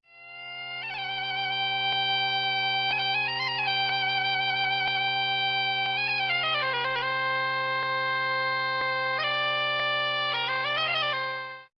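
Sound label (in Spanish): gaita; tradiberia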